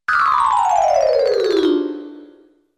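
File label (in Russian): быстрое падение с лестницы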